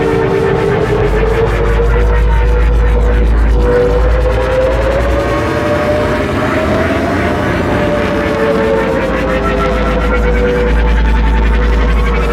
Drop Ship Engine Loop; Warframe; Corpus

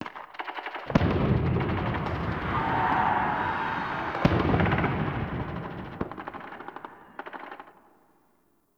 Ambient Distant Infested Explosions

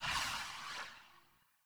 Generic Footstep; Warframe; Choir; Foley

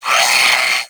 Sentient Brachiolyst Pain; Warframe

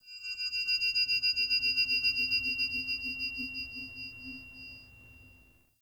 Station Chime Jingle; Ambience; Witch; Soul Plane

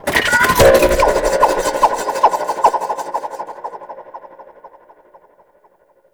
Seeker Drone Deploy; Eidolon Tusk

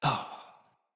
Death, Battle Barks; Envoy

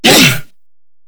Grineer Hammer Boss Pain D; Warframe

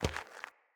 Walk Gravel, Footsteps; Enemy; Mockery; Mockeries

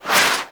Rock Punch Swing; Brawler; Warframe

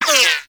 Bullet Impact Ricochet; Warframe

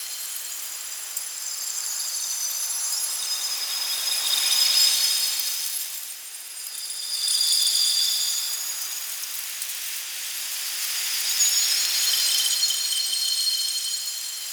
Metal Lotus Flower Alt Loop; Warframe; Tau; Ambience

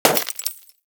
Bullet Impact Debris Glass; Warframe; Weapons; Bullet Impacts

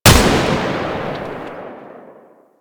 Target Practice Shot B; gameplay; Warframe; Nineteen Ninety Nine; Quincy

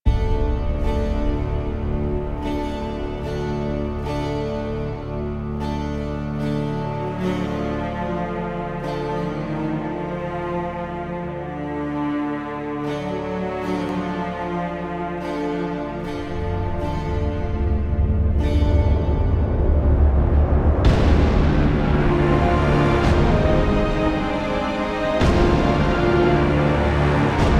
Epilogue Cinematic Cue; Warframe; NineteenNinetyNine; Stage12PartyOfALifetime

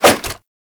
Nunchaku End Whoosh; Weapons; Warframe; Tenno

